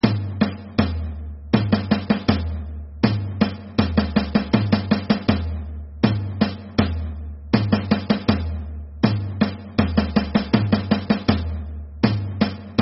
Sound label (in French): RYTHME AVEC MAILLOCHE